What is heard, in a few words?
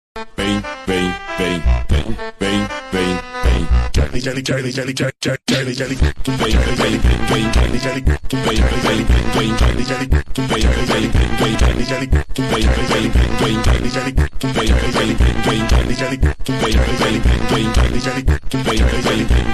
music funk